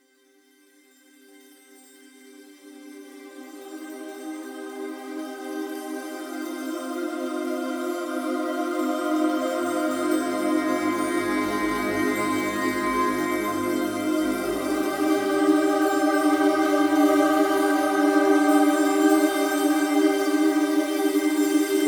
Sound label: Dales Forest Explore; Dragon Age Inquisition